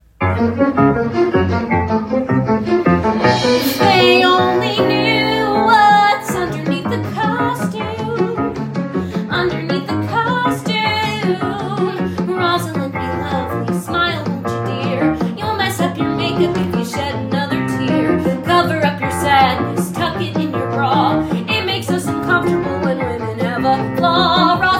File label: Be Merry VOCAL GUIDE